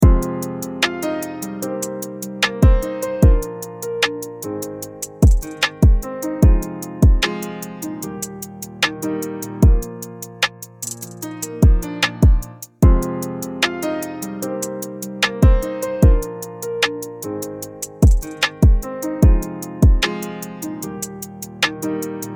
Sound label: Piano and drums